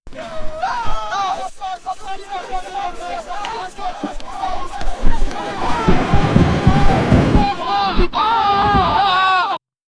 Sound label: sound of battle and peopl, war